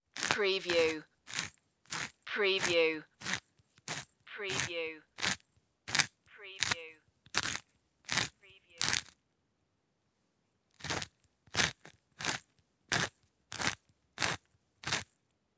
spice mill grinding

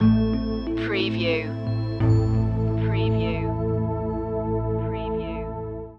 ethereal stinger

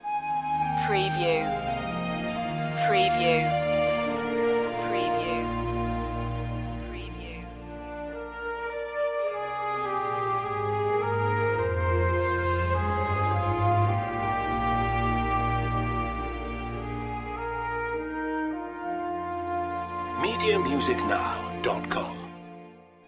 quiet pastures loop

